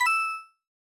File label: Coin Sequential